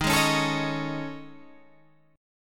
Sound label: A Major 7th Flat 5th